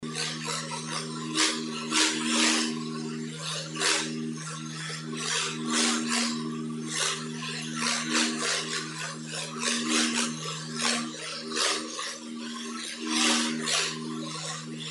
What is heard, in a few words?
Siren